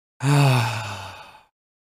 suspiro efeito sonoro

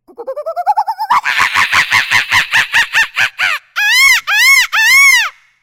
macaco na selva

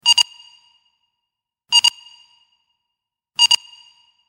som de radar efeito sonoro

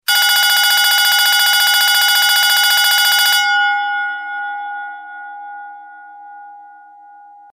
despertador, som de despertador diferente

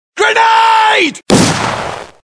barulho de Granada

